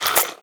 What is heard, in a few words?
breath out, headcrab black; creatures